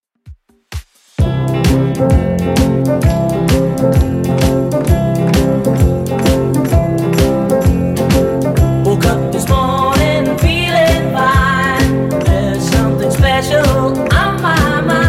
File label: 60s Redrum 130bpm